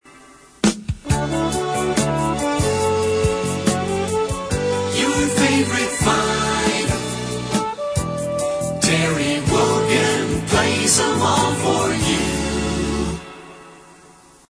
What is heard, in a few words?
jingle